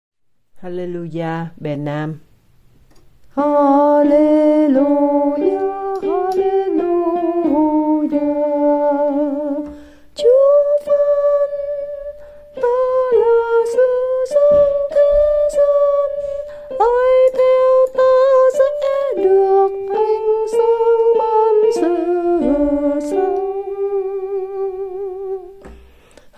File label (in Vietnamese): Alleluja nam